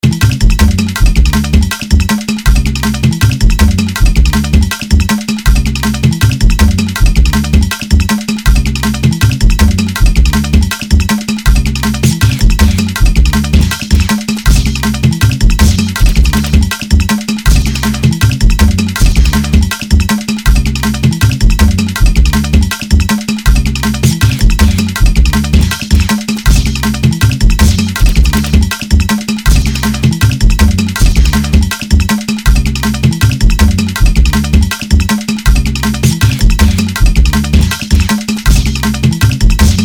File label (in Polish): Walka Z Bossem; Indiana Jack